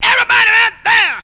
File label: VOX; ZERO-G; Datafile